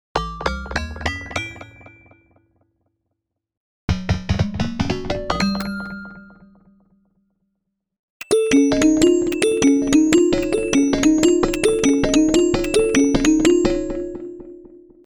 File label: reaktor Osc Mono Sequences; Ruby mono sequence example